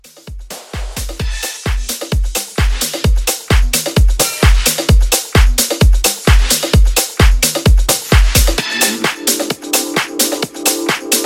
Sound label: Club House, 130; Club House Edit